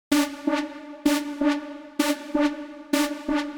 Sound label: Lead; Imbecile